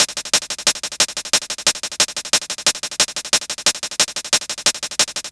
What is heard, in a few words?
Hi Hat Loop; Sonic Foundry (Sony Creative Software) - Fluid Dynamics Drum & Bass; Construction Kit